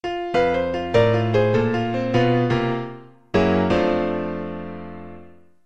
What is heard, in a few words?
blues cadence, piano